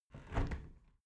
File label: window close regular